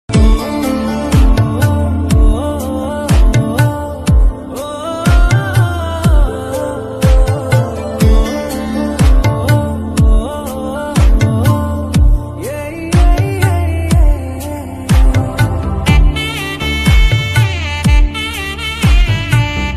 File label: feel good bgm, telugu